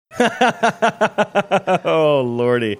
laughing saying oh lordy

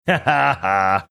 funny laugh

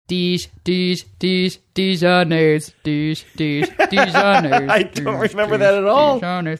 singing dijonnaise song

